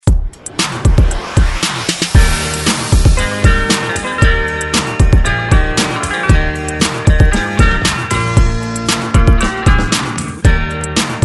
Re Drum 116 Bpm; DJDOLLS

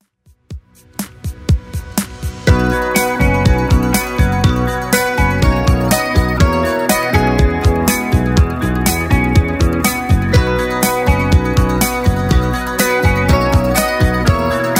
80s 122 bpm; Redrum

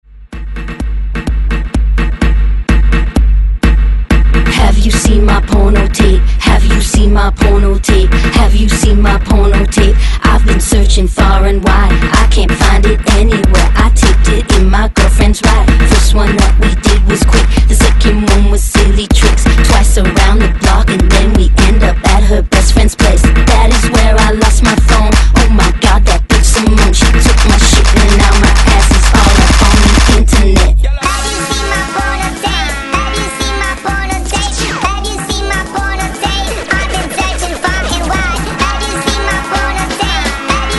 Re Drum 127 Bpm

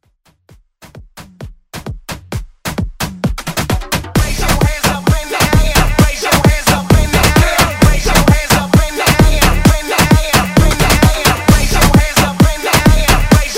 Afro Hype Edit

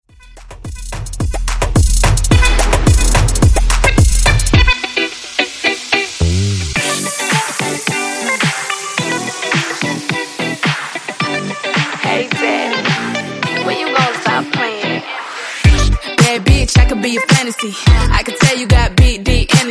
Top40 Moombahton Bootleg

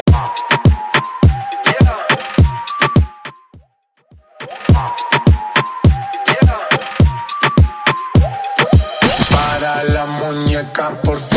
Top40 Reggaeton Hype Phonk 104; Mainstream VIP

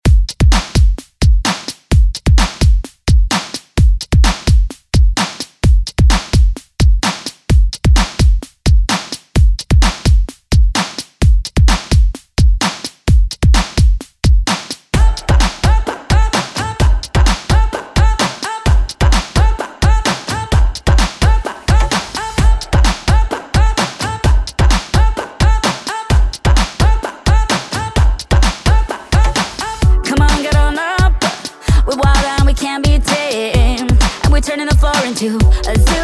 129bpm

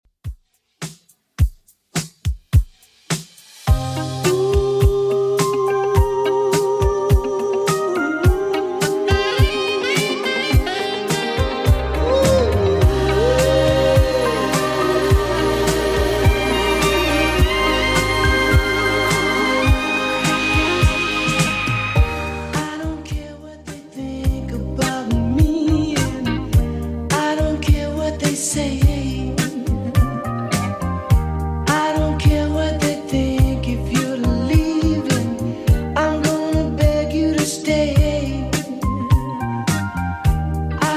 80s Redrum 105bpm CLEAN

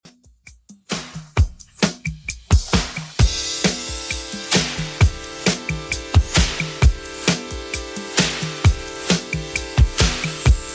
80s Redrum 132bpm

